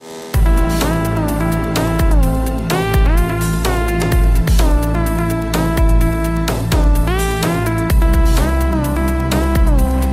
pulse

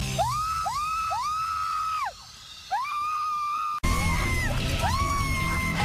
screams

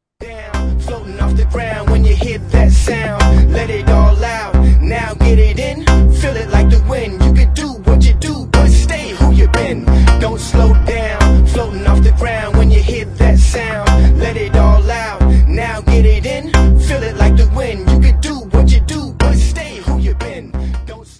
hiphop

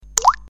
Drop message tone

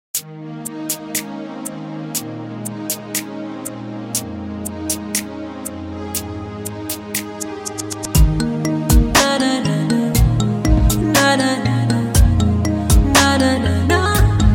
tamil

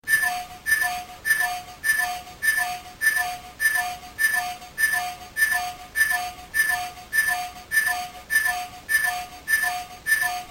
timer; ultraman zero

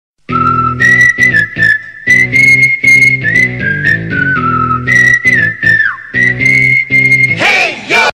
sms, ringtones; blues

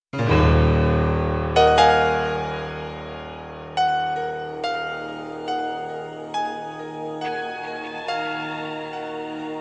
melody cool tone

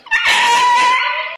Pterodactyl

